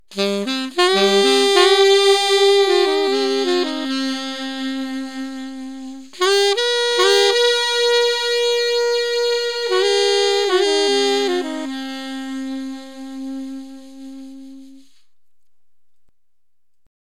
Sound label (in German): sax